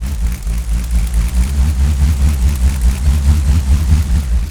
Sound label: BULLROARER, Primitive Instruments